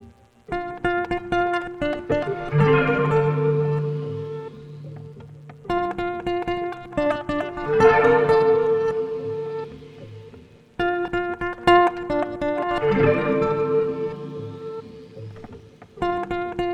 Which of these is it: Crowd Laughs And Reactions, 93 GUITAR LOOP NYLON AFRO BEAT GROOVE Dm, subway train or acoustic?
93 GUITAR LOOP NYLON AFRO BEAT GROOVE Dm